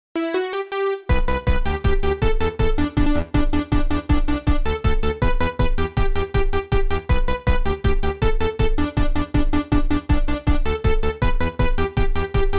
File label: chime